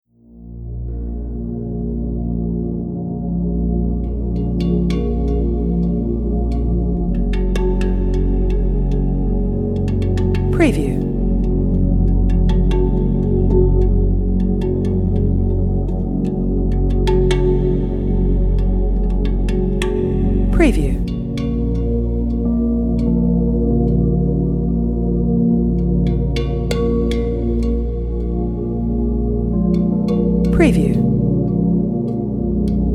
Gentle Snooze (Cinematic Deep Ambient Pads Meditation Music)